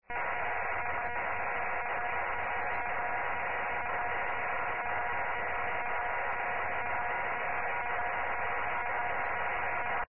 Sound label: RS92 audio, Sondenpage